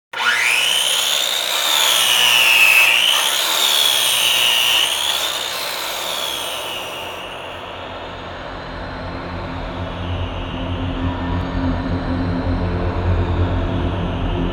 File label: saw transition